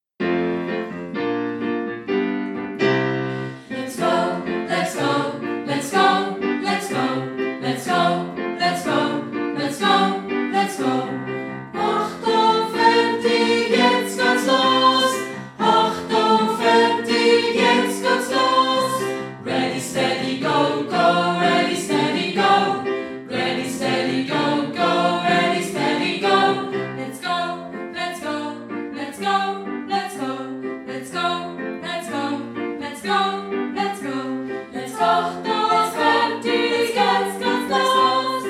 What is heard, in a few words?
audio tutti; lets go